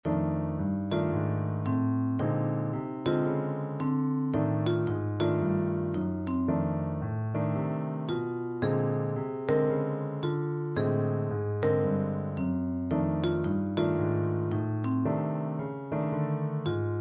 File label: swing